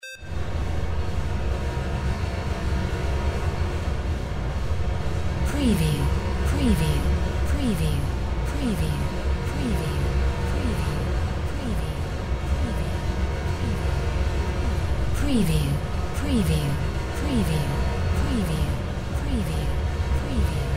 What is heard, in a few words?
drone brass generator